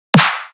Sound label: Snare; horizon